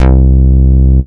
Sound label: Bass Note C